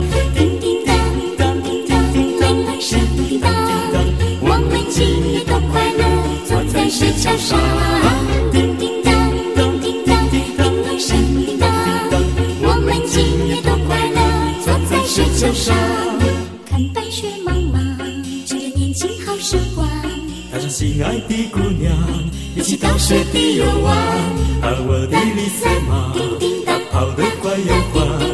jingle bells chinese

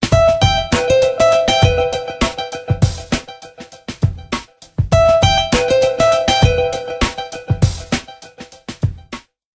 nokia lumia ring

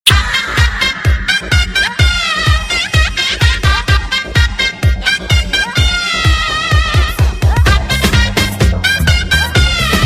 shahnai sms

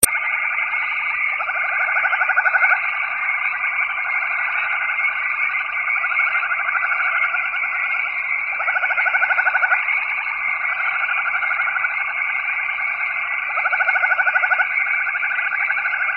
night in jungle

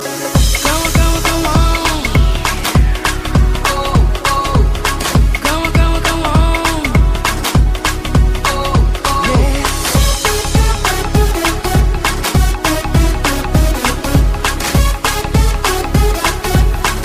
arabian mix

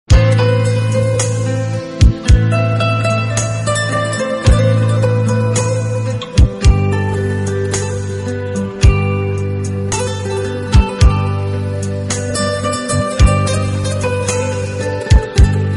persian guitar

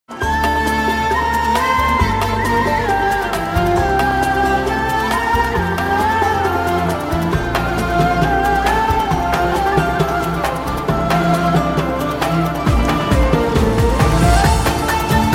nice arabic music

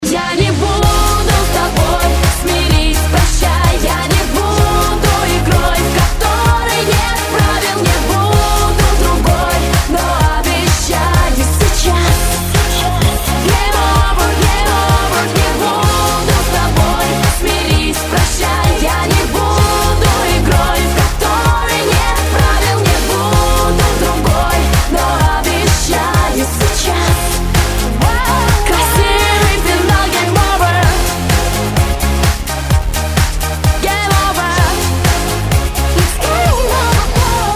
3d mix game over

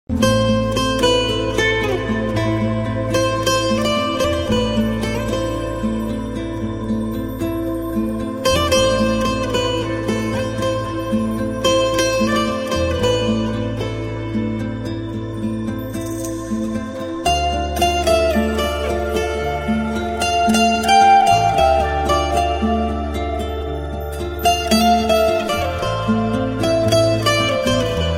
romantic guitar

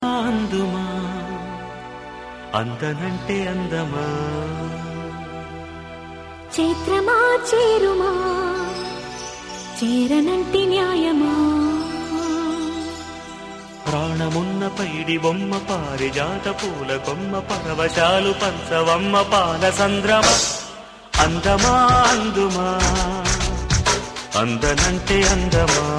telugu ringtone

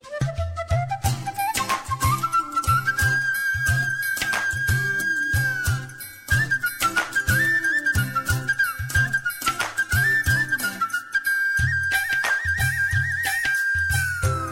sms flute

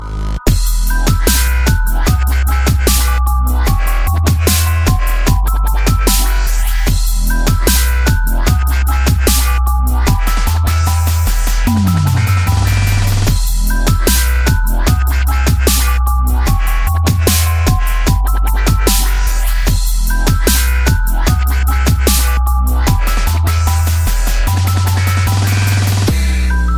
dubstep remix

